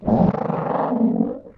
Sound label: Angel Death